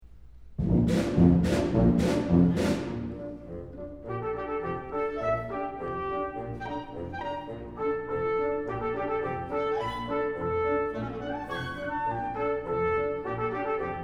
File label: Suite for Small Orchestra